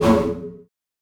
Hit Surprise; FREE SFX PACK VOL 1

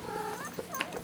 Cat Meows; Micro Pack; Trevor

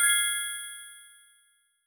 confirm, tones; inteface sfx pack 1

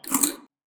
weird, sfx

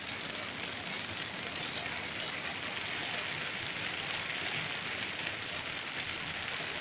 loop rain; water splash slime sfx

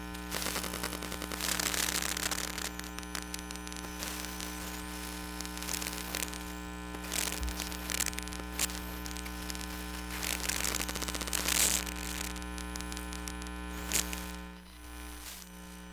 Cell Reception, Electromagnetic Sounds; Micro Pack; NazdyNate

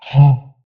weird, creature

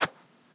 Swish, organic wooshes; Micro Pack

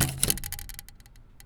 Boyioioing; BB Retail Therapy Sample Pack

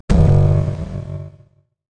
Retro Cinematic Short

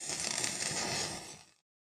spell fire; RPG

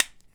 Cap Click, Pill Bottles

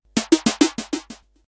space Trash; kenney digitalaudio

